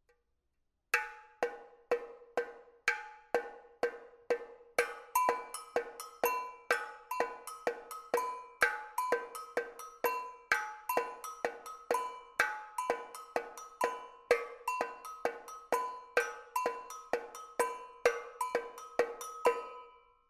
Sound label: Agogo, marcha